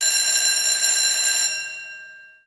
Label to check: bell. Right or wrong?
Right